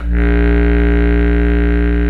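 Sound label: Bass Clarinet; Roland L-CDX-03 Disk 1; Lo Clarinets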